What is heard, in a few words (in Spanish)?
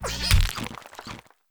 Grito; Tinkatink; Gritos de Pokémon de la novena generación